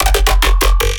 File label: bass hit Slow Down F; Virtual Riot